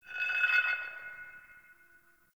Exterior Man Hole Drag; Warframe; Ambience; NineteenNinetyNine

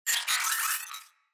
Space Drone Pain; Enemies; Corpus

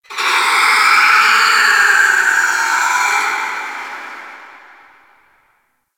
Ice Critter Distant J, Ambience; Warframe; Corpus Ice Planet

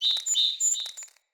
Fey Flock Attack Dive

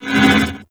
Void Portal Rotate One Off; Warframe; Ambience; Orokin; Oro Sabotage Objective

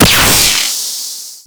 Beam Fire; Warframe; Corpus; Super Moa Beam

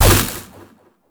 Shield Stagger; Warframe; Warframes; Garuda

